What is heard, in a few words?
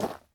dirt, footsteps